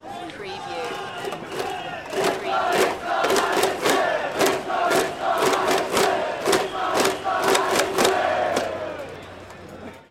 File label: Hiroshima baseball crowd chant Japan; Hiroshima Carp